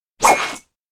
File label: elec cup fly; anemoi